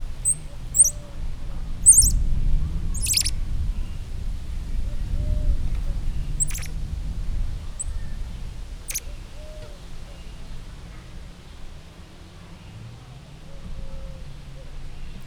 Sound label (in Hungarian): hangosabbak, közönséges mókusmajom; Győri Zoo 2014